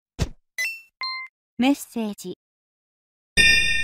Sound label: Vocaloid SMS